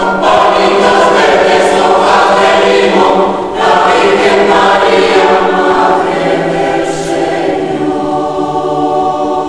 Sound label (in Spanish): CORAL